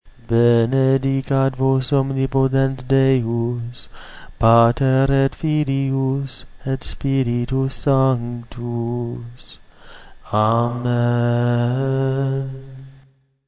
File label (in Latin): Benedicat vos, chant